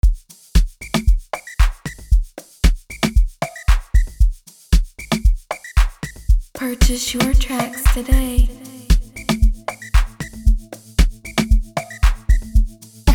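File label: Amapiano 115bpm A major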